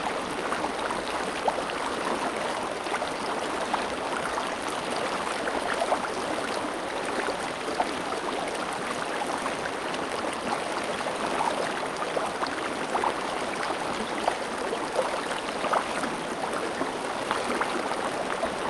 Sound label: rapids near field loop; sonniss gdc